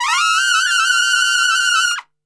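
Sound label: F trumpet shake; Best Service; Brass Super Section; TRP SHAKE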